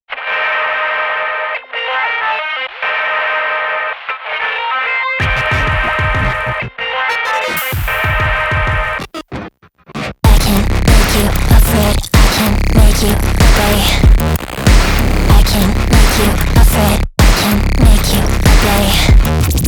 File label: Bleep Bloop